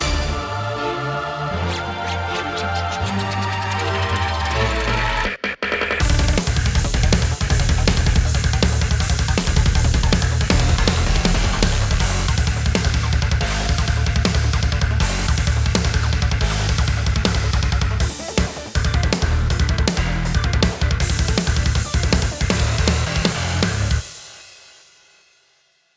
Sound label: Intro 160bpm; Rhythm Sprout; deleted soundtrack